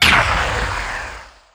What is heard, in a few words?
tag team target; tusk